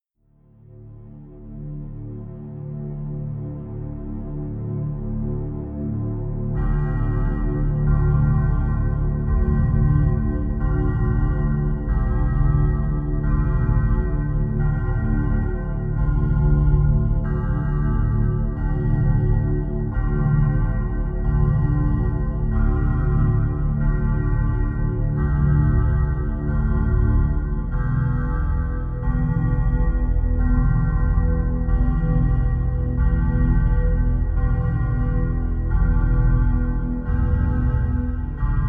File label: drone bells